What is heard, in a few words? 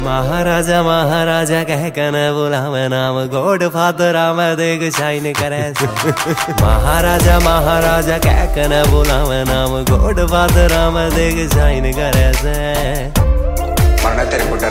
Ringtone